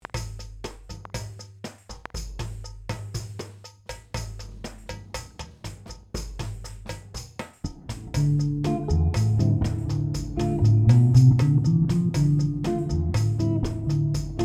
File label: Samba Swing